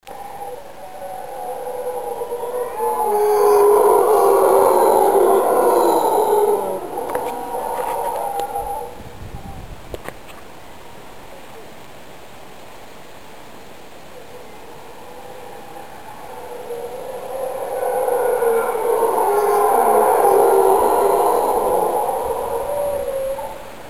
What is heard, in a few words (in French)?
Hurleurs; Amerique